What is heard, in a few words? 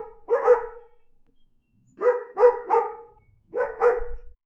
muffled aggressive barkin, fence